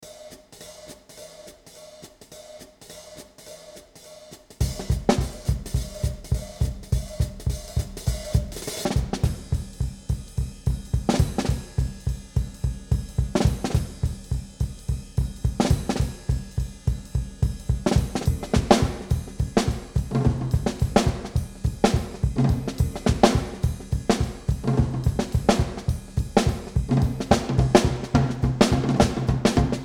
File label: tape breaks